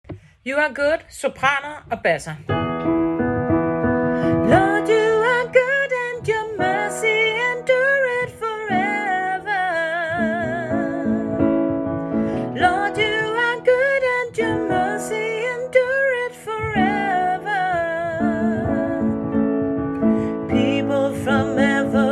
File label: sopran og bas